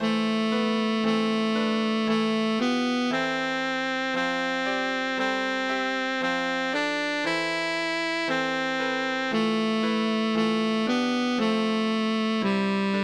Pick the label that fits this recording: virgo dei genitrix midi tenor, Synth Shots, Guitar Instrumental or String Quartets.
virgo dei genitrix midi tenor